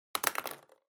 Mechanical Pen Put Down

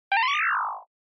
Motion Pop

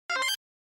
SNES Shooter

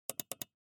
PC Mouse

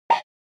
Motion Eating

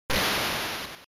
General Noise Single

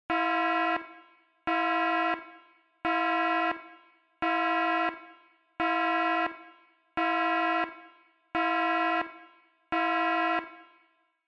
Warning Siren Slow Long

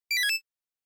NES Racing

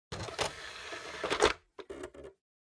Optical Disk Drive Close

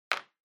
Felt Tip Pen Put Down

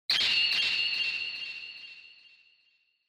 Flash High Delay; Onoma

